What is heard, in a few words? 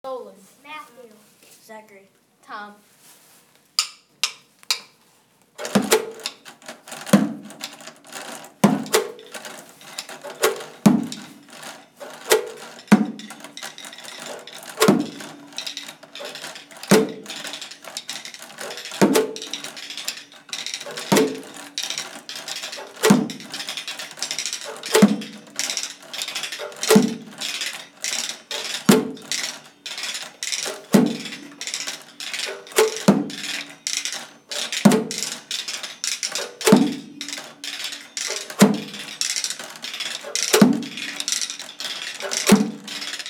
found instruments